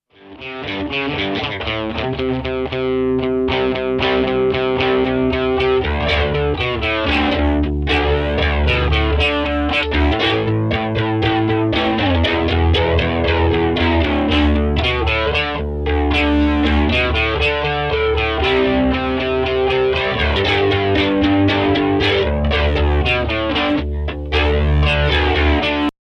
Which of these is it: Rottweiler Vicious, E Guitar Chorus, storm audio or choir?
E Guitar Chorus